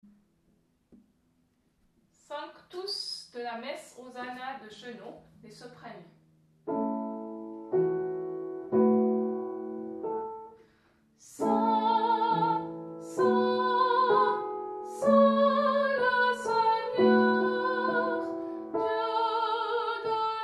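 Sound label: sanctus messe Hosanna sop, soprano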